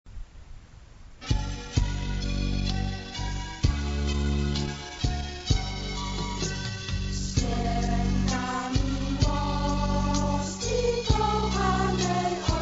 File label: hymn